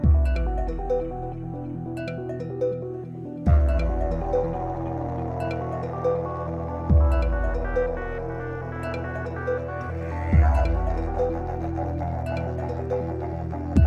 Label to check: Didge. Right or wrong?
Right